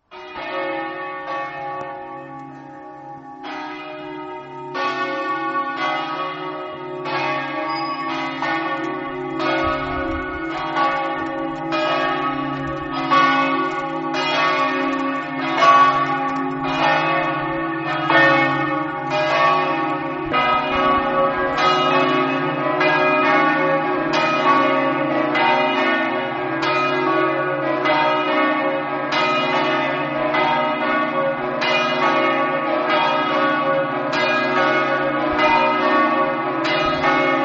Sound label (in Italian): belluno, campane